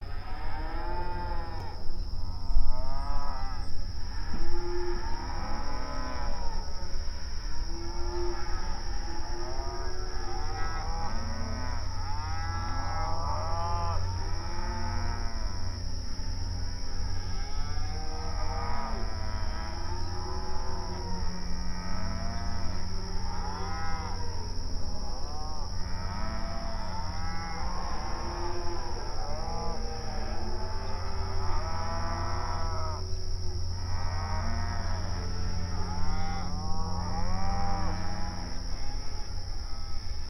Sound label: Weaning Time Sounds